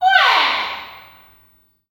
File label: BIRDCALL, Heart of Africa; Spectrasonics; BIRDMEN